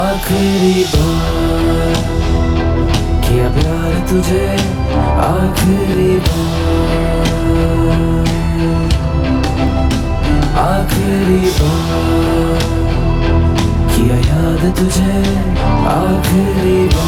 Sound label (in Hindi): ringtone hindi song